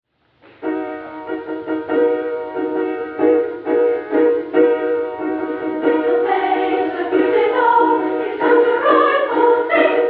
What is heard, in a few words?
choir piano; Christmas; This Little Babe; Benjamin Britten; Ceremony of Carols